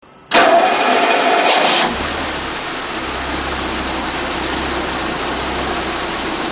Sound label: marginal ignition amp